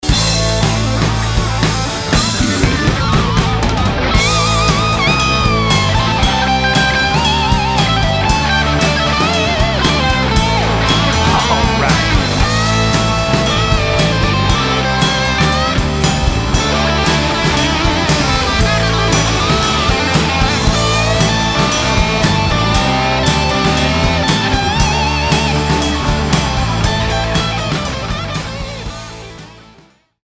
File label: Bad Bad Guitar Mojo